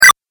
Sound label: 8 Bit Style Pick Up; Video Game Sound Effects